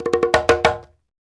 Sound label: BRKDR, Reggae; Spectrasonics; Supreme Beats; World Dance